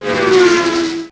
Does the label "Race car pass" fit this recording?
Yes